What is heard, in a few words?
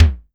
SIMMONS SD-5 drums